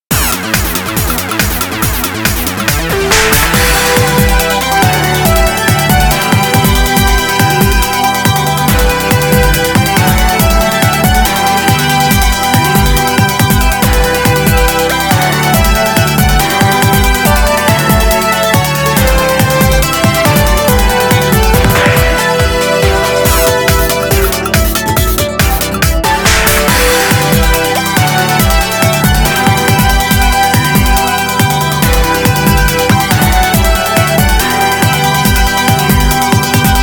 original instrumental; マターリ・ゆーまのメガピコラジオ

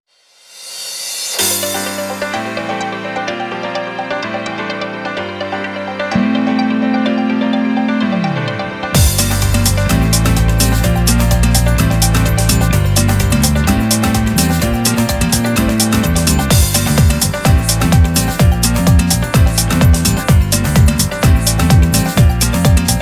Instrumental

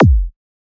Kick

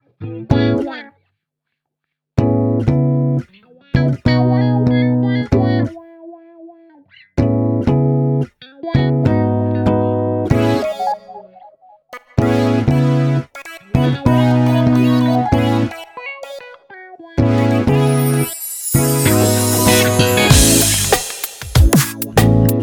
Raindrops Instrumental

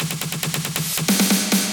Percussion Fill

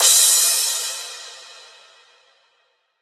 Crash

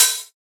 Open Hat

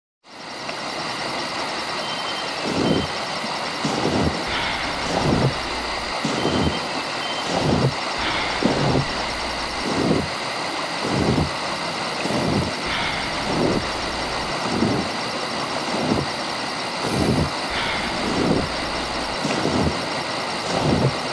ambience

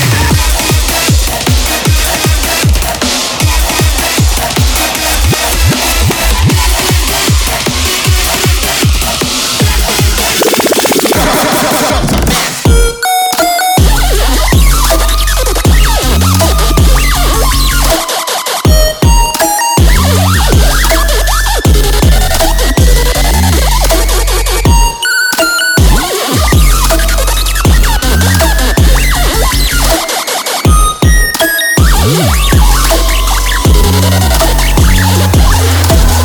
instrumental; 東方紅楼夢19

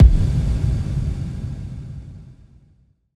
Impact Mid

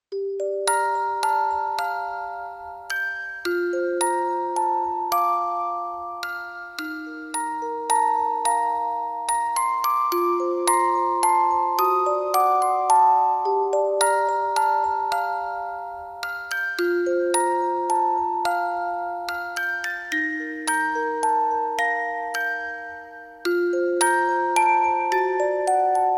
Music Box Ver

